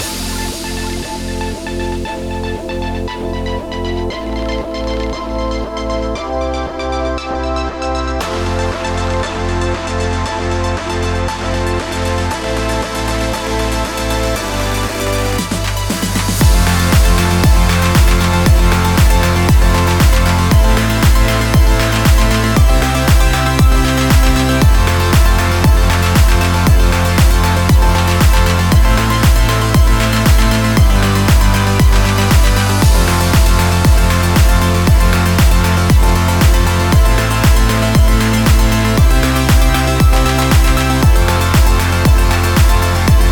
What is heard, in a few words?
universal background pop